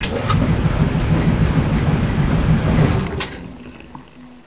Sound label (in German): Schleudern